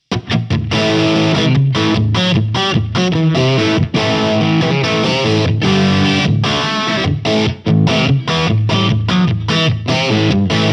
Hughes Kettner Nano Heads Vintage Crunch Sagging 12 Uhr